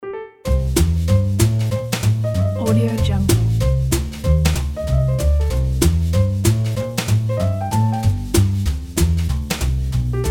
the jazz

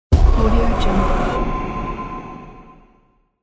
jump scare; infinity sfx productions

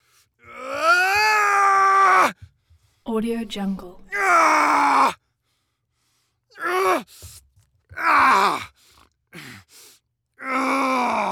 aaargh

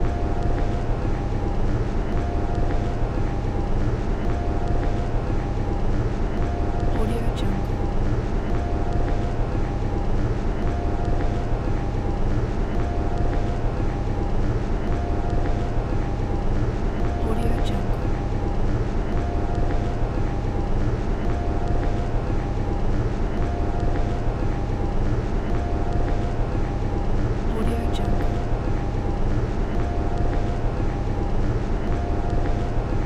empty room ambience

